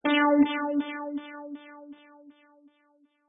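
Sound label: dotted eighth delay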